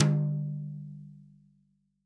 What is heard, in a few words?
TOM, Real Drum Kit, SWING KIT